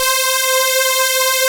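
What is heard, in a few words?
Synth C5, Lead; Xtreme Lead-1; Digital Sound Factory Xtreme Lead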